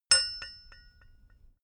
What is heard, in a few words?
metal bolt ring; industrie-wirtschaft